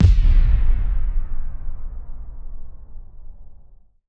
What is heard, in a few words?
Impact; impact samples